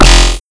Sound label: Kick